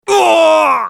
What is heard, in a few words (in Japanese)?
ダウン; エデュアルド